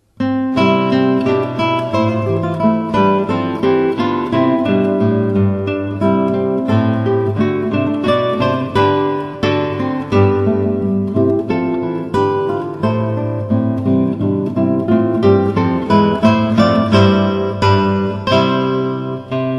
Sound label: 3 Guitars